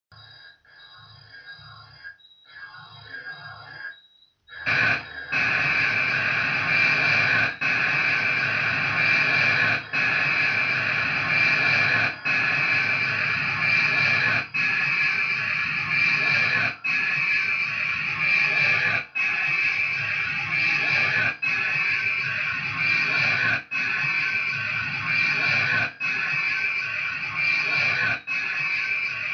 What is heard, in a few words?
missile